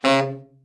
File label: TENOR SN, Sax; TENOR SHORT; Giga Samples Collection